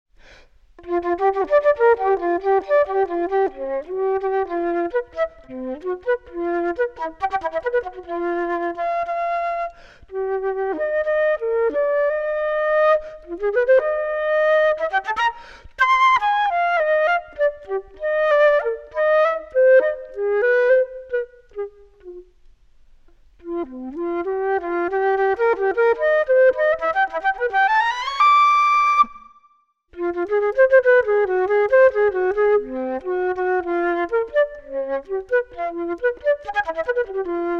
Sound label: square pegs flute